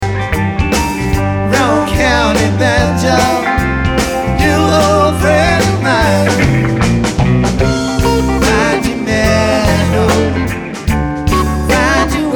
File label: Banjo